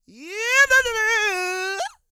SING, Vocal Planet R&B & Dance; ILIO

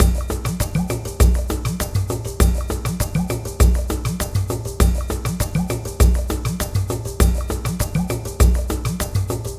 Cave Ceremony, percussion; Sonic Foundry (Sony Creative Software); Joe Vitale Latin Percussion

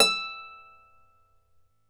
Celtic Harp, Plucked Strings

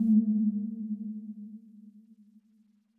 WildFX, African; Quazibeat; African Secret; SFX

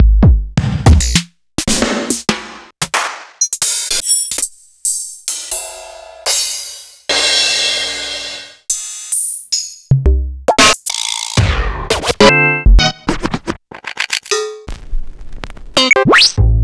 Kit; proteusvx

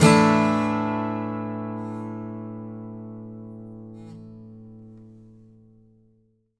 ACCGTR SUS, Country; Whole Lotta Country

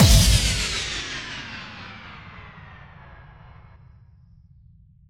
Impact

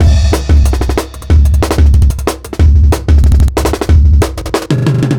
Cut Beat 4 Bar, Drum n Bass; KJ Sawka; Drum n Bass & Beyond

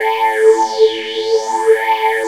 3100 FLM A#3, Korg PS3100; Back In Time Records; Korg Universe VOL-1

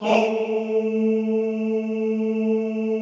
HOUUU A2, Extended Classical Choir; Best Service

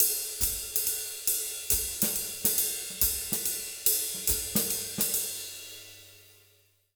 Jazz Latin Drumloops; USB Soundscan vol.08; AKAI

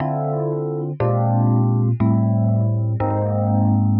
120 Rhodes Chords D, Keys, Nu Jazz House; PowerFX

